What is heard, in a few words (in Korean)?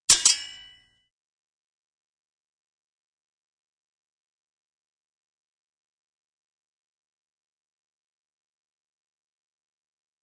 Fights, 싸움-전투; 헐리웃특수효과음